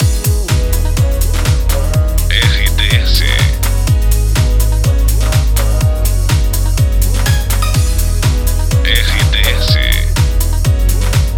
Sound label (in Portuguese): TRILHA PARA LOCUCAO; CLUBE FM PIRAPORA; Pacote Rádio Clube FM, Pirapora/MG. Emissora com perfil Jovem